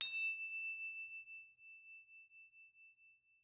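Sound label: Crotale Low; Roland SPD-S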